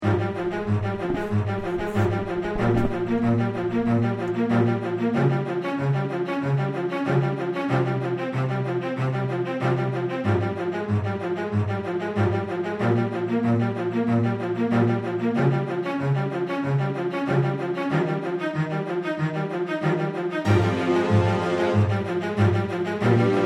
instrumental

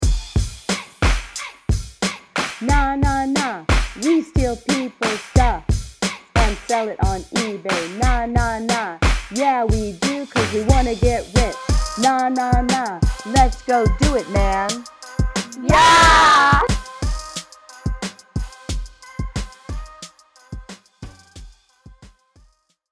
Nah Nah vocals

